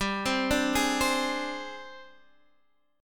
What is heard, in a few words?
G sharp Major 7th Flat 5th